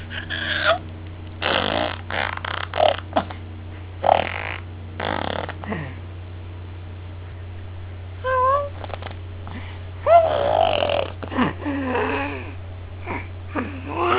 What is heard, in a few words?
spitting